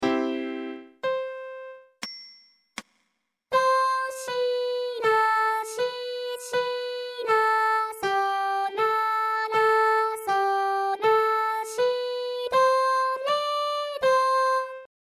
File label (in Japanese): ミックス音声(L：ピアノ R：ボーカル); コールユーブンゲン No.4 d)